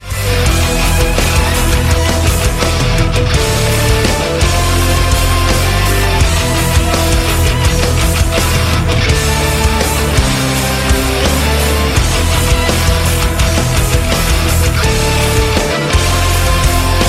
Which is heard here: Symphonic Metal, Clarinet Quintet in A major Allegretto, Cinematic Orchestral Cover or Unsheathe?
Symphonic Metal